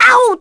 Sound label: Damage, Vox; kingsraid; voices heroes en